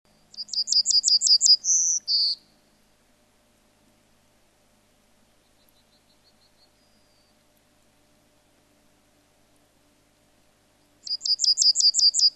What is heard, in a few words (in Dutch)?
Geelgors emberiza citrinella